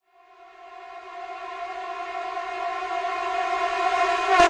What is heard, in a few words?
backwards trombone space; Various Strange Sounds and Effects